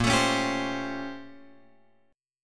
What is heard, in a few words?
A sharp Minor Major 13th, A sharp; {6 X 7 6 8 8} strummed